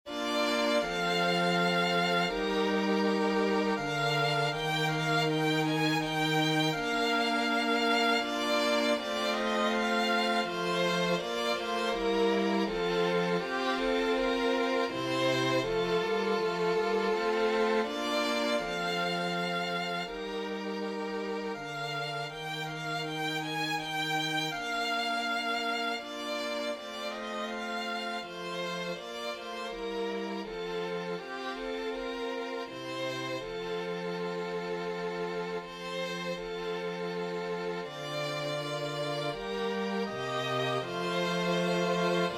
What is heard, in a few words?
menuet; purcell henry; fairest isle